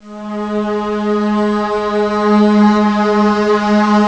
DARK synstring; haunted; jazz jackrabbit 2

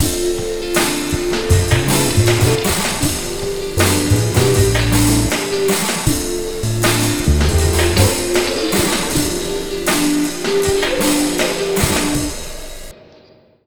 Slo Mo Full, Lounge, Slo Mo Funk Mixes 079; Zero-G Kocktail Kollection; Construction Kitz (Lounge)

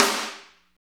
SNR, Tekno Industrial; East West

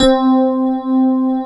FM ELP 3 C3 L, Keyboards Old School; USB Soundscan vol.09; AKAI

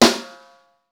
SNR, Snare

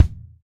TY Kick; Roland BK-5

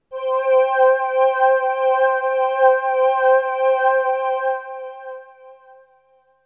Melody oneshot; ZERO G - Datafile DISC 2

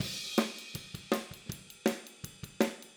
Hi-Hat, Drums, 162 BPM; Drums from the Big Room The Mixes; Take Your Toms Off; Chorus 01

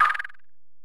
EPerc, Alesis DM-PRO; 300 Drum Machines

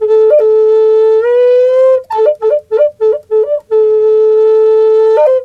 A WILD, FLUTE WILD; Big Fish Audio - Didgeridoo and Other Primitive Instruments

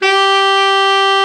TENOR, Tenor Sax; Tenor Sax 1

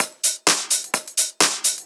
Discohouse Loop 128 BPM

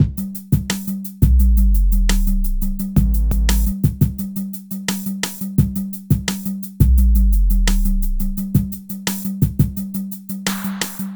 Beat, Hip-Hop

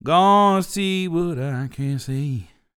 DELTA, BLUES

